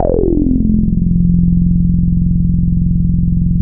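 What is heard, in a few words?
C3, major minor violin trill; INIS - Vintage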